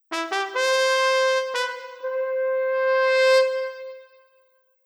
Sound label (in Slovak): trubka